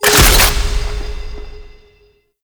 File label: Modifier Pickup; Gameplay; Warframe; NineteeenNinetyNine; PvPvE